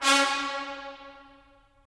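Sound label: brass trumpets staccato; Roland - LA Composer vol. 4; 90 s Sample CDs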